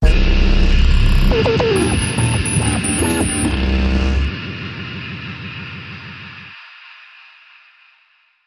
Dubcore Sting

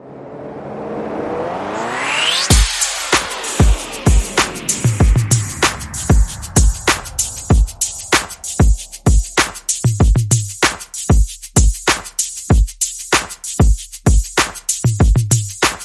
Getting It Done, Perc and FX

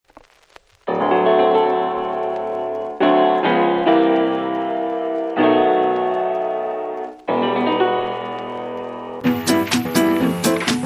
Steaming Clarinet

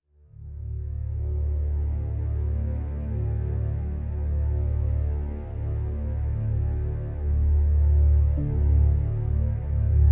D minor No Guitar

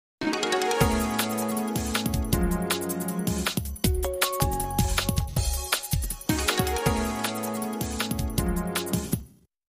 Breaks n Beats

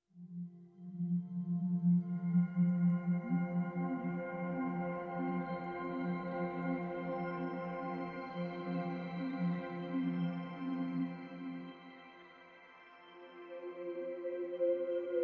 Pads Only

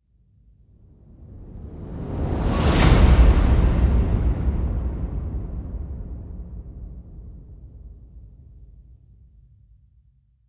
Hit Big Undulation

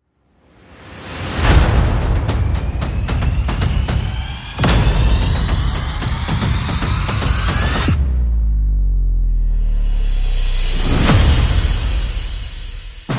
Percussion and Effects

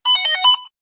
Quick Beeper Tune

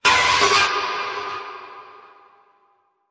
Devil Howl

